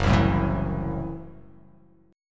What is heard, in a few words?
F sharp Minor 7th Flat 5th, guitar chord; strummed; JGuitar